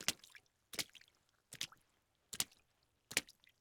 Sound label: Step Water Splash; Footsteps; HauntedBloodlines